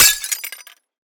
glass impact break; video game; Bluezone Corporation

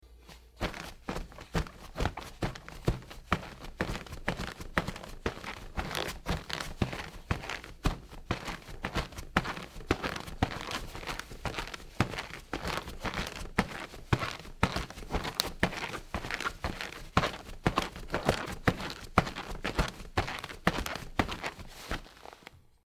Snow Jogging, footsteps; Todd Blankenship

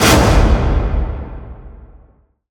hit classic; trailer; Bluezone Corporation

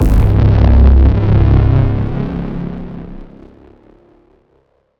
cinematic texture; trailer; Bluezone Corporation

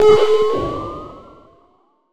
interface, spaceship; Bluezone Corporation

